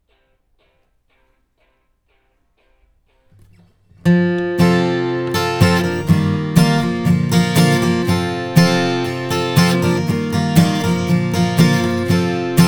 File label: Ac GTR